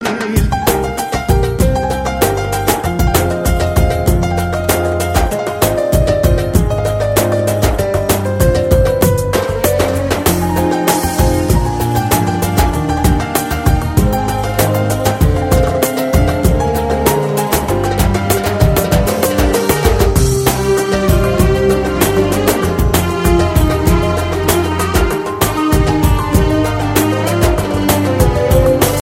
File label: arabic great music